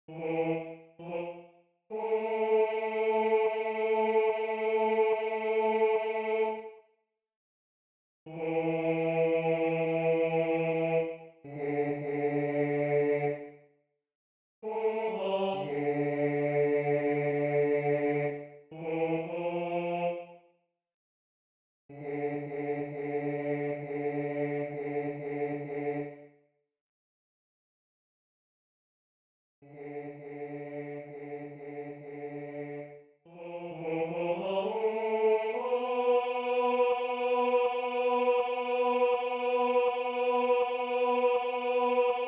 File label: bass